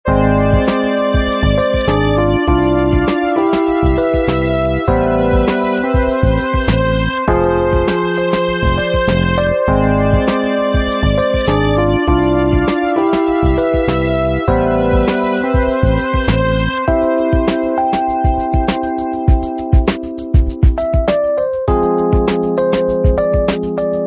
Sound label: City Beat